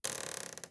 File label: wood creak; devour 2021 gamerip pc macos